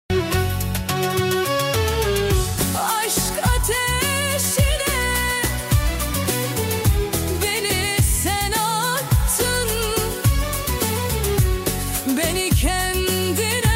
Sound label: trap remix cover